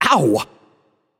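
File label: nonverbal ouch; voicepack; smite; merlin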